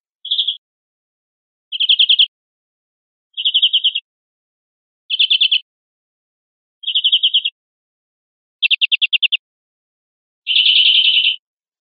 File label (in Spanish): chorros cortos campaneados, verderon